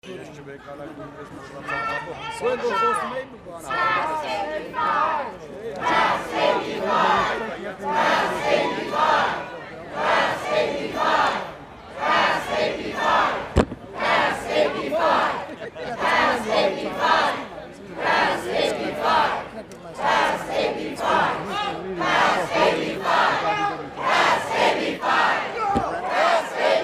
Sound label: Nat Sound Chanting